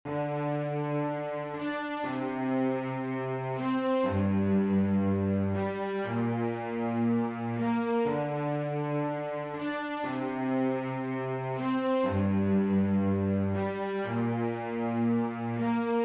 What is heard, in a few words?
thor cello; route audio through thor cello